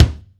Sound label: Kick Drum